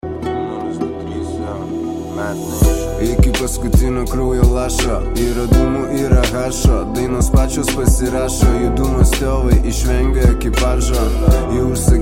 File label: MIXED Hip Hop; RSD Studios